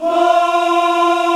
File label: VOX, African Aahs; Roland LCDP11 Africa VOL-1